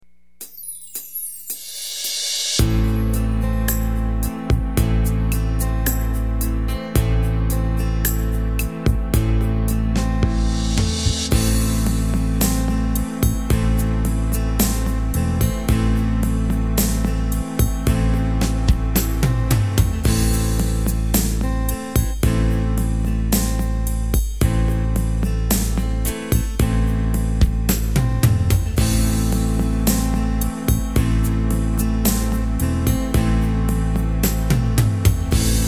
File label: slow techno; yamaha